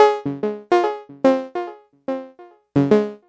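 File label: echo buzz flat, 146 bpm; SYNTH AND ELECTRONIC LOOPS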